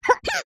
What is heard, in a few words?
cartoon character vocalisation high pitched fight grunt